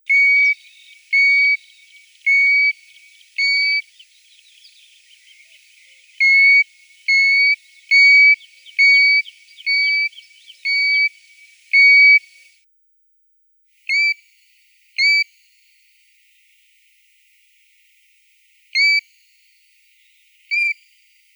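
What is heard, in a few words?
rzhanka zolotistaia, golden plover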